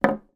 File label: toy marble put down on wooden table